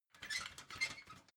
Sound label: ladder metal handling soft